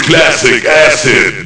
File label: classic acid; Amiga Soundtracker Sample Packs